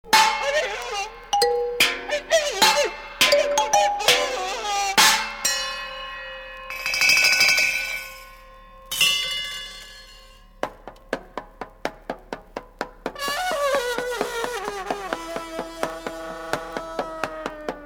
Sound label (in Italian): Duetti